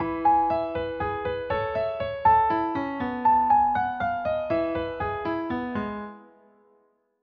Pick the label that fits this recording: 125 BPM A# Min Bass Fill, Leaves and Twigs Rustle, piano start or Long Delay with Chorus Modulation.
piano start